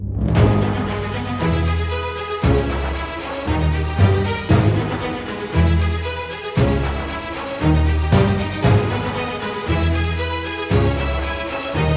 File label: Fight Loop; BIOMUTANT; FatherWolfFightLoop